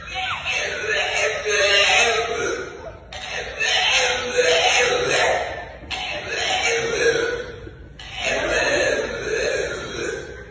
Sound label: horrible dog bark cough wail